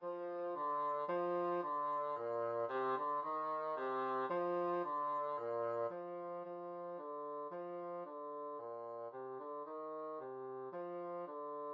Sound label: oranges and lemons, bassoon